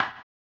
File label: HAMBONE; ILIO; Vocal Planet VOL-2; Blues & Soul